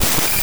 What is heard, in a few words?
noise